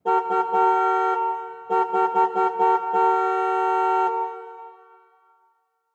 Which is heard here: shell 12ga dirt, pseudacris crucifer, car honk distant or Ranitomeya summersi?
car honk distant